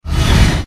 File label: backstab effect; abilities